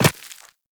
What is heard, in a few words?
Walk Rock, footsteps; Warframe; Characters; Operator